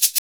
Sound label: SHAKER, Modern Percussion Loops; Soundscan vol.56; AKAI